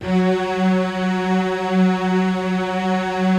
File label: PAD, ATMO PADS; Best Service; XX Large